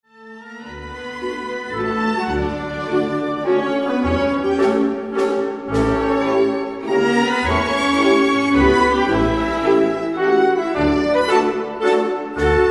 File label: polka mazurka